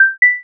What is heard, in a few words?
notification